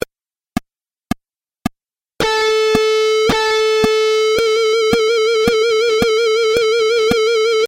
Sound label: Layered Harmony Example